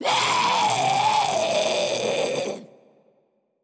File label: Scream; Mirro Scream B2